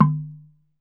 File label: TUNG LO, African Perc; E-MU Producer Series Vol. 7 – Old World Instruments